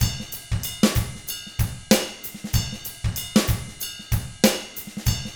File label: 95 FUNK, Drums; ILIO; Double Platinum Drums 1